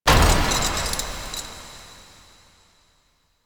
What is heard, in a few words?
Cylinder Stop; Warframe; Entrati; Vaults